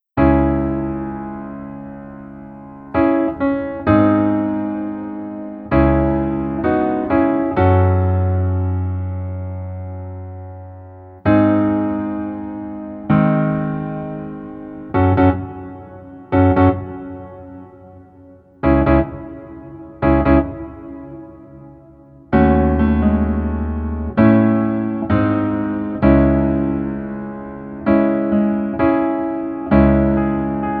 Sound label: Piano